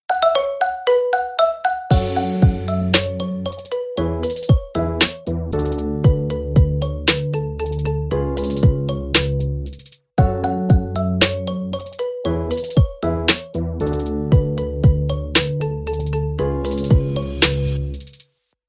marimba remix ringtone

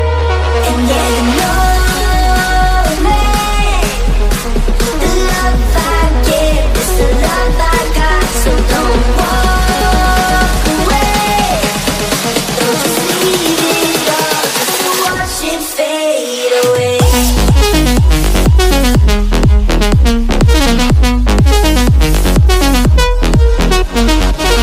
english music ringtone

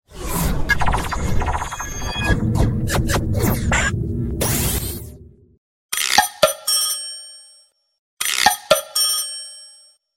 space sms sound ringtone

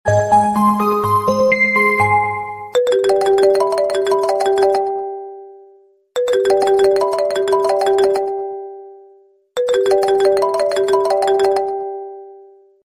apple ring

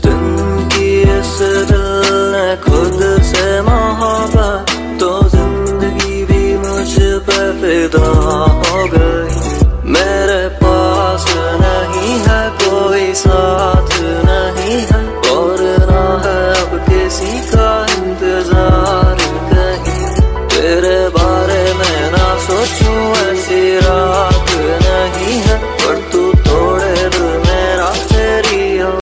hindi love ringtone, bollywood ringtones

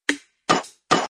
sound alert ringtone

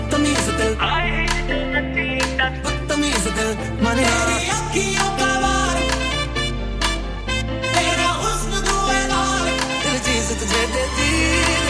remix song ringtone

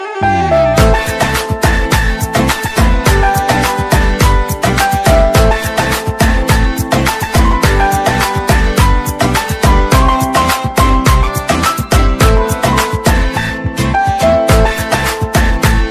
sweet bollywood music ringtone

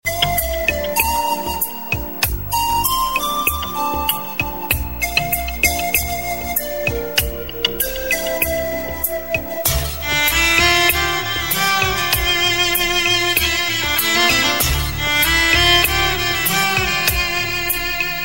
sad love flute ringtone

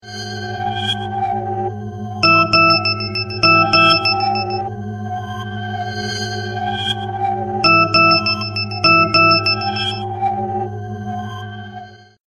3d sms space nokia ringtone

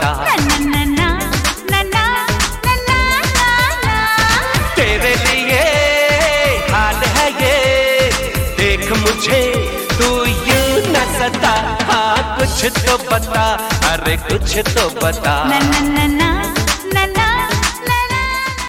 hindi song ringtone

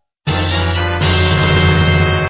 chest open ringtone

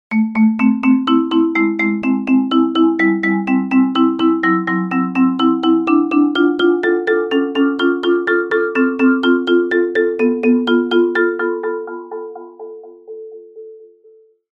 marimba tune ringtone

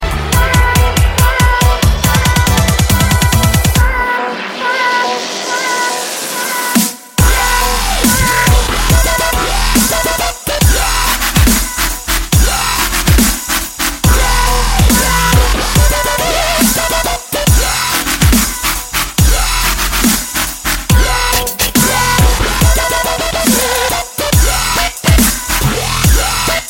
dubstep ringtone

